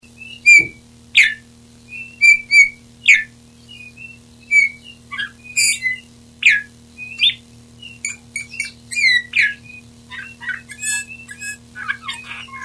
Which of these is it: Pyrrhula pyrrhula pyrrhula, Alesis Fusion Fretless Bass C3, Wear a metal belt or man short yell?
Pyrrhula pyrrhula pyrrhula